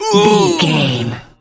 voice male effort struggle

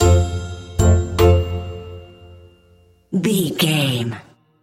silly oaf sting, silly, circus, goofy, comical; innocent; naive; child like; childish; Sitcom; Dramedy

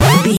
retro collect pickup item

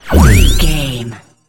sci-fi shield device power up

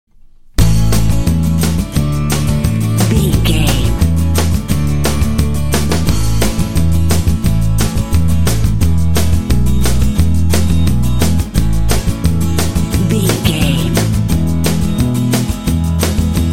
strumming breezers

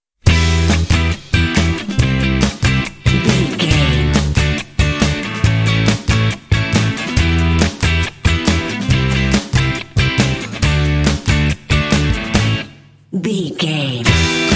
indie pop cool vibe

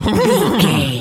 horse neigh calm

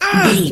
voice male hurt pain

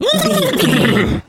horse neigh

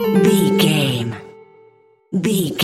jingle chime negative

